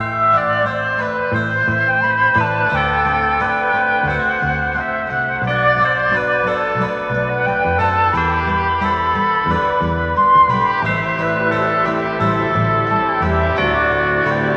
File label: flute and strings, Loops, Sad